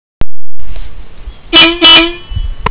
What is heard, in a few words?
Beep; Gypsy say 'Hello'; Caples Proposed Wilderness; California Wild Odyssey